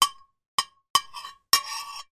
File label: household knife or other cutlery hit and scrape on china plate